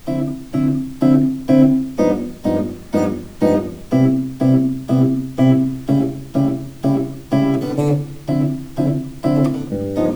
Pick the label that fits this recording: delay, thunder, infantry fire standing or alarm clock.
delay